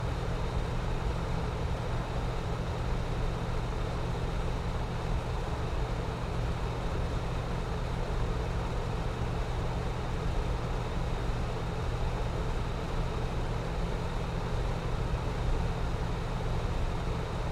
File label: Ventilation Fan, small box, metal, electric motor, disconnected, whirring, spinning, high intensity; loop; Eiravaein Works; Vaeyan IV